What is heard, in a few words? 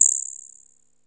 guiro, synth guiros; Zero-G Ambient 1